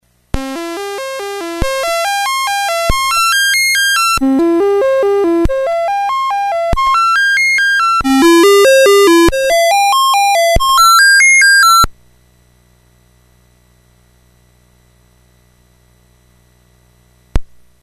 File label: no noise gen